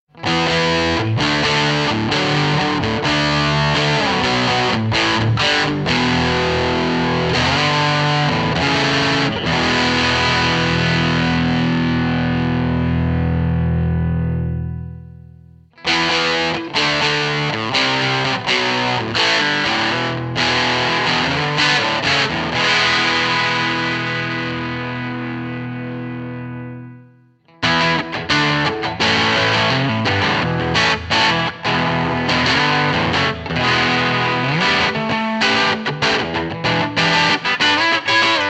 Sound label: Fulltone Dist